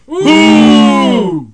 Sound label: UHHH